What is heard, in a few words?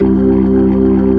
B3 C 1-4, LES